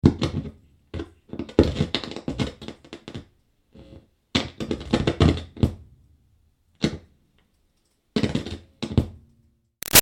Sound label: Static Guitar Plug In-Out; Interference; Buzzes; Musical; Guitars; Big Room Sound